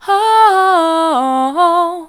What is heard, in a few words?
ooh soft, vocal